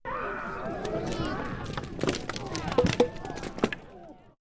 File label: Arrows Hit Shields